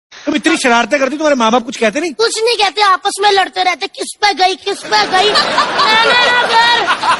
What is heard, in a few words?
comedy show music; kapil sharma